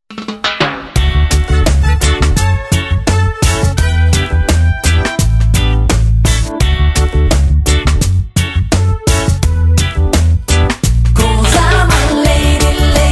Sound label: Reggae Version